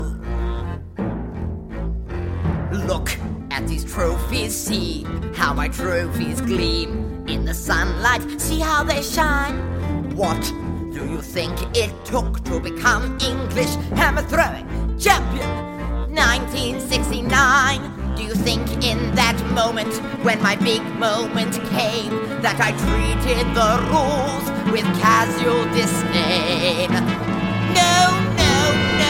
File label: hammer vocals